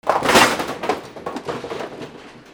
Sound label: GAMES